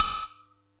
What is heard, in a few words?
Yamaha EX-5